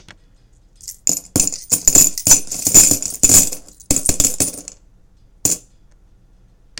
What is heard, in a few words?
Coins Fall Into Coins